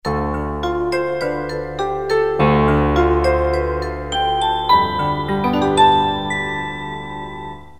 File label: Vibes